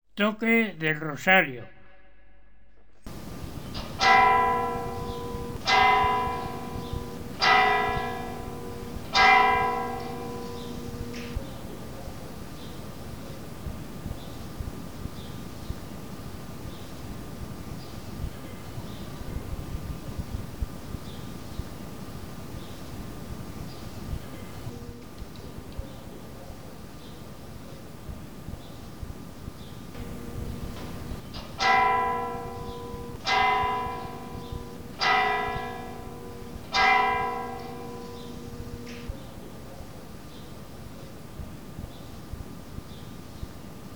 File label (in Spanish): Toque de Rosario, Campanas